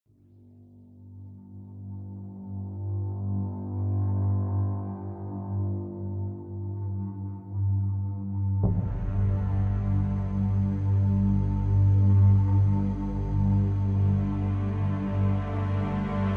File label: Loop Emotional